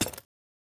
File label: ui formation